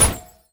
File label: Rainbow Shoot; Servants of Necroterra; Goozma